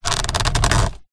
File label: transport car hand grasp steering wheel leather squeak